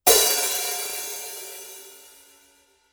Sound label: B8 14 hh loud open hit